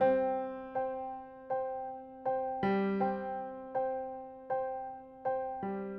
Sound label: Delicate Piano